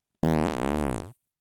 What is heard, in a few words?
fart; vegan